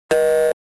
busy tone

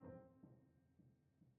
piano harmonics C4, Grand Piano

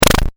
Wall

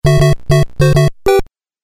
start; game-super-mario

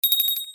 success

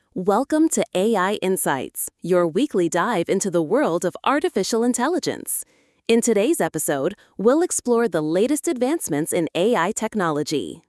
podcast intro

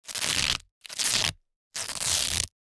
medpack consume; hygrounds

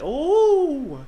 ooeeh

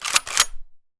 shotgun reload; hygrounds

